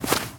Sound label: Footstep Snow Run